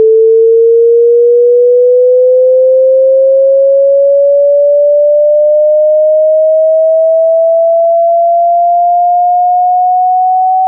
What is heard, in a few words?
sinc 44100 stereo